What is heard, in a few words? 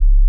D flat 4, pure tones